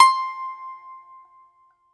BAGLAMA, Plucked Strings

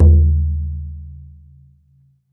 BODHRAN, Celtic Percussion

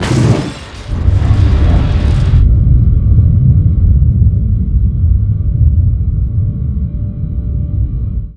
EXPLOSION, Disjointed Textures; USB Soundscan vol.33; AKAI; CHAOS 1

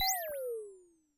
Roland TD-20 Percussion

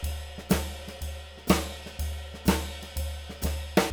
SWING, Living Drums

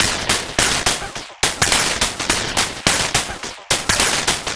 rainstorm, 105bpm; Zero-G; Freak Beats

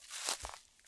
Rustly Step, Footsteps, outdoor

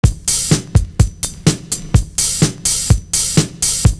BD 1-3 SNR 2-4, loop; Univers Sons - Kitch 70s

